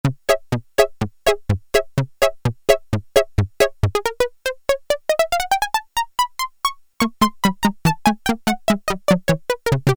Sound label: Pizz Strings, Korg Poly-61M